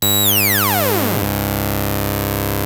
ARP 2600 G#2, synthesizer; Hollywood Edge - Giorgio Moroder Rare Synthesizer Collection

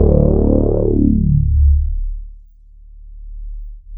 bass, synths

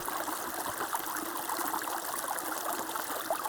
Waterway G Dark

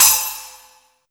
CYM SPLASH; USB Soundscan vol.27; Ragga & Dub Reggae; AKAI; CYMBALS K

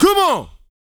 VOCAL, R&B Dance, HIP-HOP

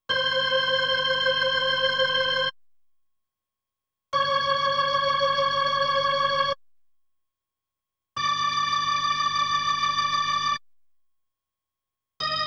B3 Organ; Masterbits CLIMAX 8

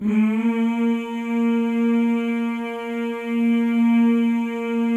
male; Spectrasonics; Symphony of Voices

